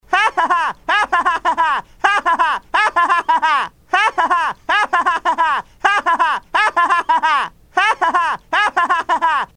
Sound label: laugh; mandark; Phone Losers of America Media Pack 2017